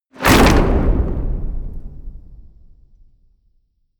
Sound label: hit wood, hits impacts